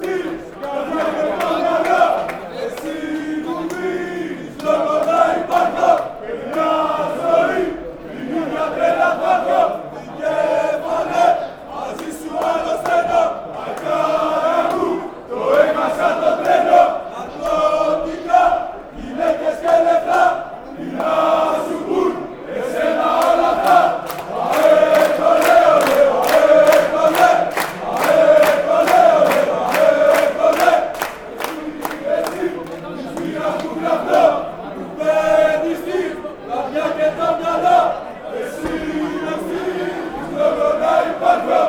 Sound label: aek athens chant